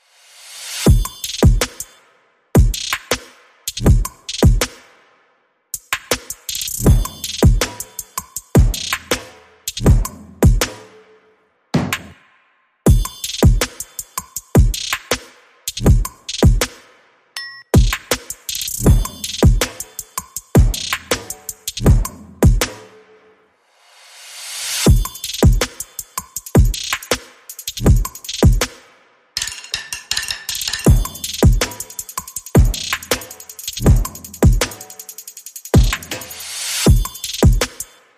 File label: Simple Drums Only